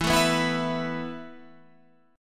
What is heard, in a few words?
E Suspended 4th, Guitar, Standard; Esus4 strummed